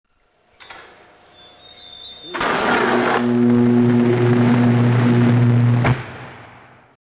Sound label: 345kV SWITCH